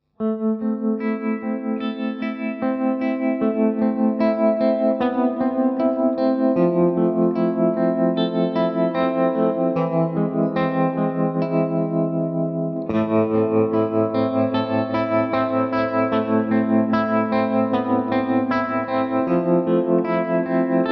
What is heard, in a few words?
X-VIBE STEP SLICER; xgear